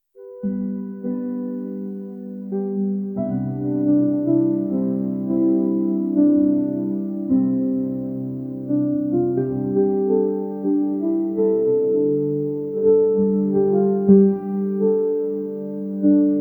uplifting calm background; educational

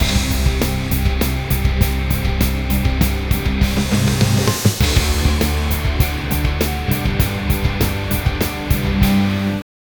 rock soundtrack, electric guitar

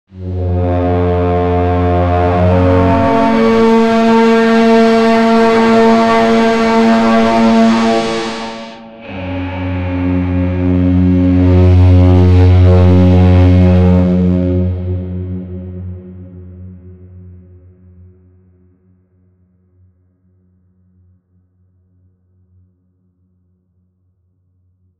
menacing deep booming med

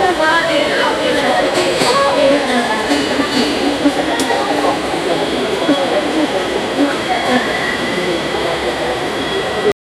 ambience of a busy Indian railway

